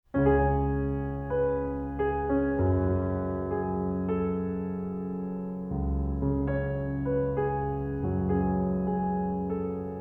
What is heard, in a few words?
Porcelain Piano; Porcelain Piano Version